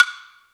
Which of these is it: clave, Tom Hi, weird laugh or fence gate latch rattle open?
clave